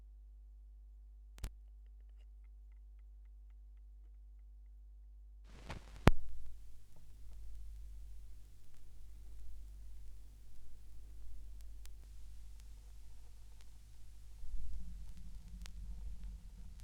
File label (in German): schallplattengeraeusche; rauschpartikel